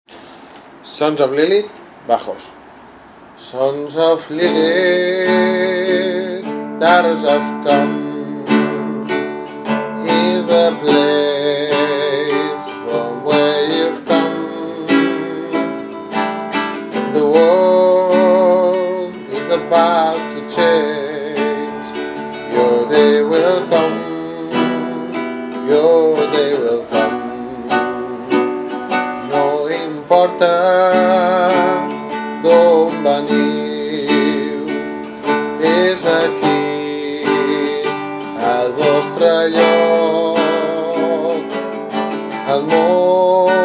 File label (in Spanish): bajo